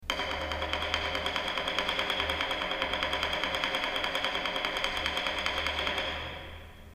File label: drum taiko rimshot sequence